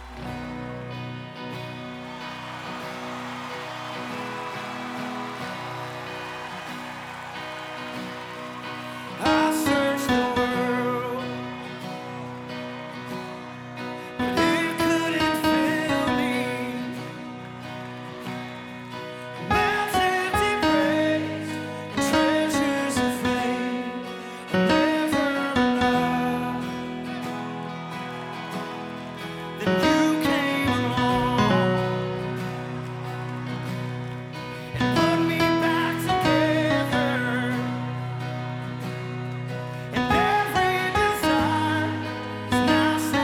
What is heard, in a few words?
tenor